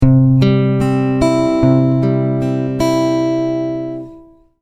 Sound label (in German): zupf